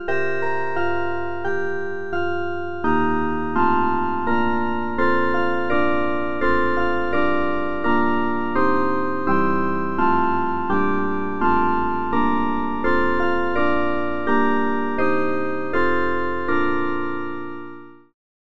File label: crystal keys